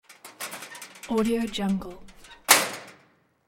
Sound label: metal locker door opens and closes